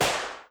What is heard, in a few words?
Cannon Hit